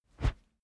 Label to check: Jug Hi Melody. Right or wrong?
Wrong